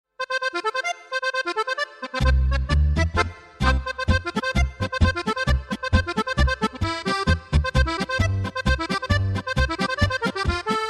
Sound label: Polka